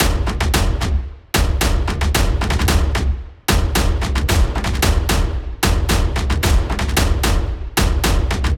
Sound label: Drum